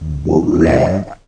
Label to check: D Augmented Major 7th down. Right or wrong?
Wrong